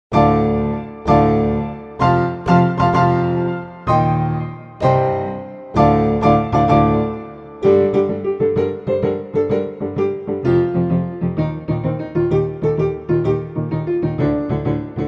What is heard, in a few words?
piano; PIANO TUTORIAL